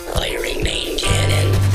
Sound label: firing main canon; freckles